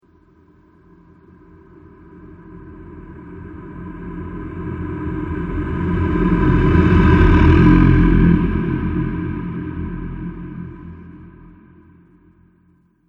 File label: Shadow Spirit, ghost; Ghost Sound Effects; horror sound effects